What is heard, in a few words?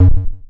kick, drums single hits; iElectribe Kicks